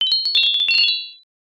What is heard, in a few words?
kagura suzu